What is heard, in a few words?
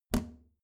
plastic trash can close regular